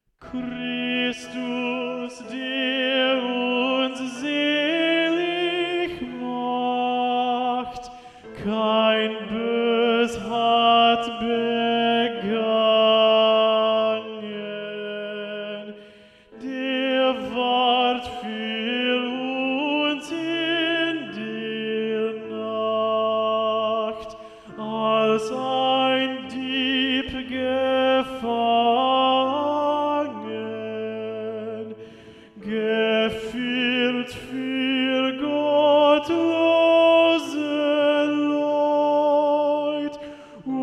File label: Tenor